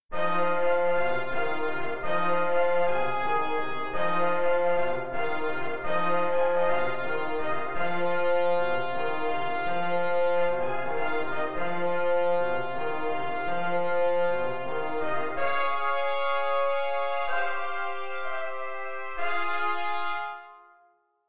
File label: Trumpets and Tubas